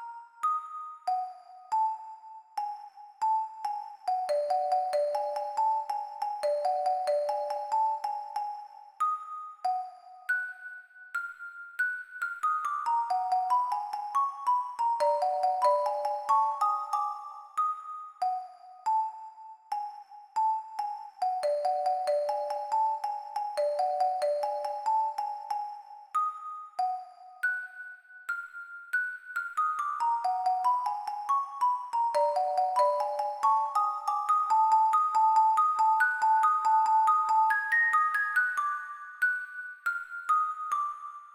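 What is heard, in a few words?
xylophone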